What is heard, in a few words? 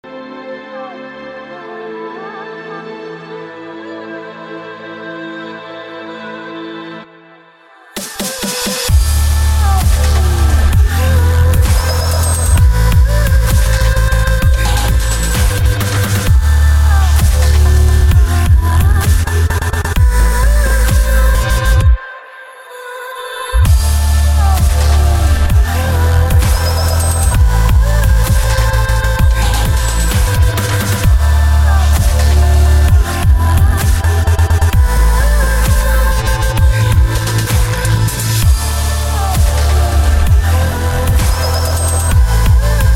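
cool dubstep music; saundtrek forsazh 5; klassnaya dabstep muzyka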